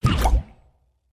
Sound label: propeller Breaks